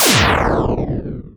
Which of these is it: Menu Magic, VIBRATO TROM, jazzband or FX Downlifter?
FX Downlifter